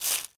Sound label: leaves step; Golden Crayon Sounds